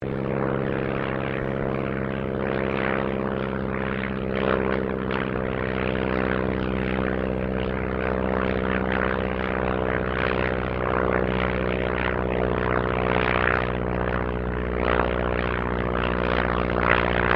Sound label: AIRCRAFT DH82A DEHAVILAND TIGERMOTH PASS VERY SLOW; 1942; Airplane Sound Effects